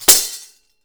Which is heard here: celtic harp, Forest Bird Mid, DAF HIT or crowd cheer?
DAF HIT